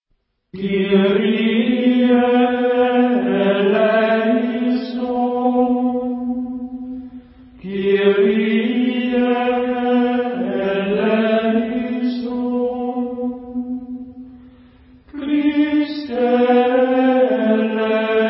Kyrie XVI Gregorian Chants; 古典（格列高利）

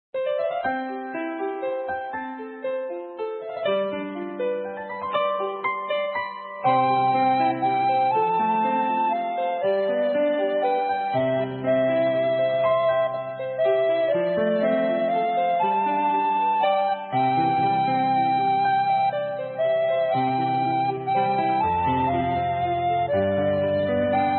Morning Prayer melody

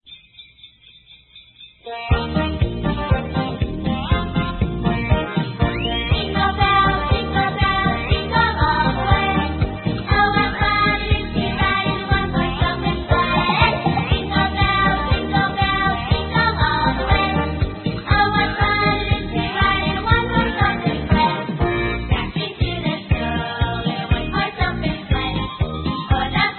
jingle bells; 圣诞诗歌

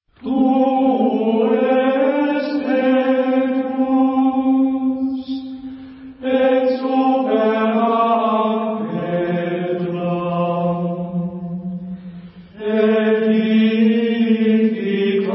Tu es Petrus Gregorian Chants; 古典（格列高利）

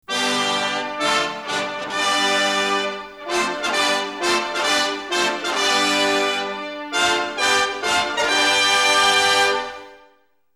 Fanfare From Start of Race; Ben-Hur（宾虚.1959）

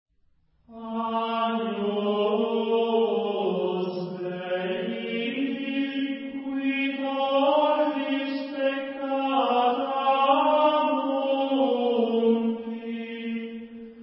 Agnus Dei I Gregorian Chants; 古典（格列高利）

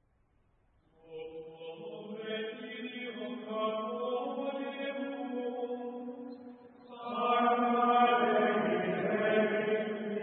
Sub tuum praesidium Gregorian Chant; 外文圣歌

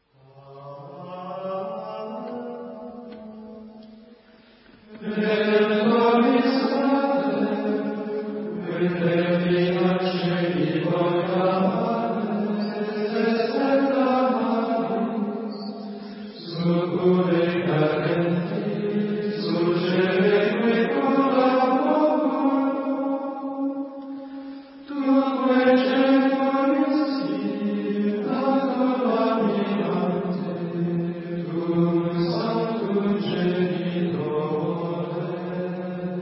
Alma Redemptoris Mater Gregorian Chants; 古典（格列高利）

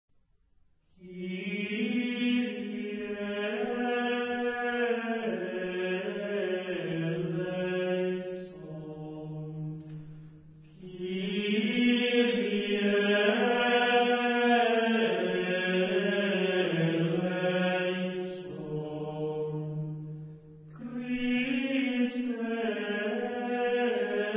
Kyrie I Gregorian Chants; 古典（格列高利）

